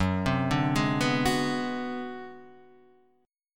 Ab 7th Sharp 9th Flat 5th Gb, chord, guitar

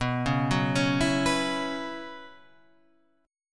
B Suspended 2nd Suspended 4th, Guitar, Standard; Bsus2sus4 arpeggiated; JGuitar

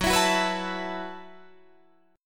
F sharp Minor 11th, Guitar, Drop D

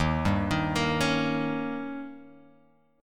Eb 7th, Guitar, Open G

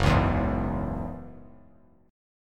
Gb 7th Suspended 2nd Gb Bass Standard; strummed; JGuitar

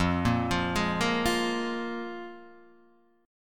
F Minor Double Flat 5th, Guitar, DADGAD

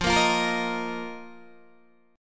F 7th Suspended 4th F, Guitar, DADGAD

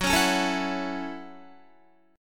Gb Minor 7th, Guitar; Gbm7; strummed; 13,16,13,14,16,14

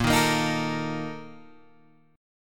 A sharp Major 7th Suspended 4th, Guitar Open G

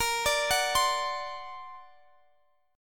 D Augmented 7th A sharp, guitar

